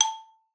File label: A4, xylophone; Xsample VOL-4